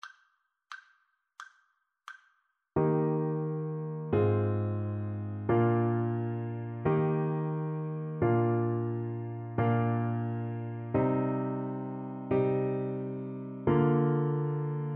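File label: string quartet; harmonic error detection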